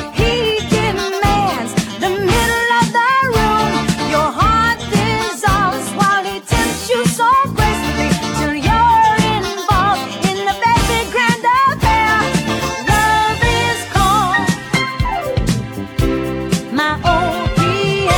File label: piano